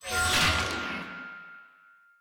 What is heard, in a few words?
ladder Exit; netent